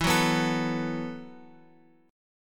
E Suspended 2nd Suspended 4th E, down, Guitar, Open F; strummed; JGuitar